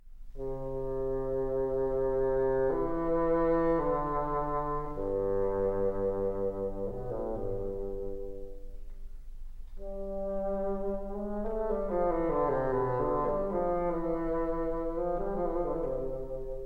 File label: kuhlau flute fantaisie; no1 excerpt